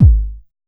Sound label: Kick Hit; 089 Amin; Hip Hop Exotica; Big Fish Audio